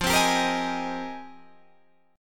F Minor 11th, Guitar Open D

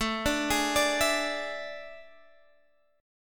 A Major 7th Suspended 4th, Guitar, Half Step Down

